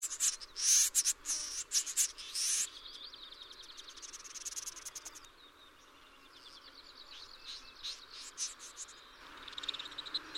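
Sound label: Gallinago stenura; Kahlaajat kurpat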